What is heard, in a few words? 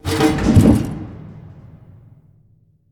Pressure Plate Up